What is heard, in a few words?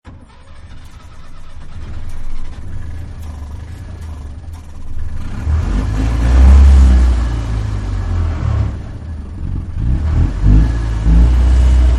Tuk Tuk Start; Carriages; Vehicles; Partners In Rhyme